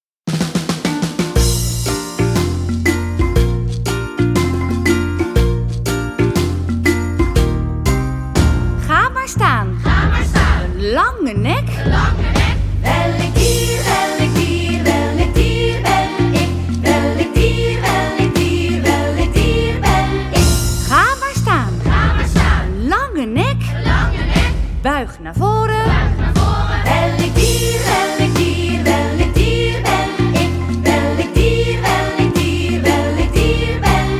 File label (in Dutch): (Struisvogel, Chimpansee); Djambo En De Rangers Op Safari